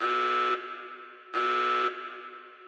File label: Klaxon, alarm; Nuclear Tech